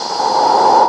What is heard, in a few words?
Static; Blastwave